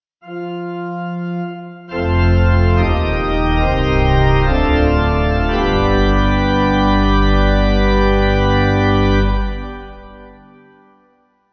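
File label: Gr Amen Acc Pipe, Pipe Organ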